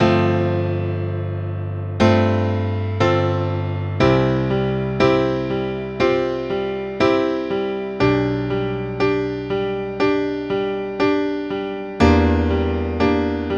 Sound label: STUDIO PIANO